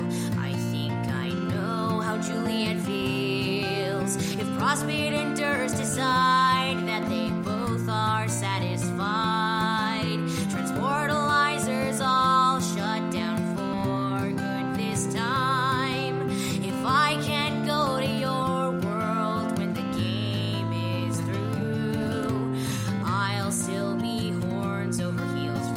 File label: Horns